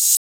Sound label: Open Hat; Modern Drum Kit; Tart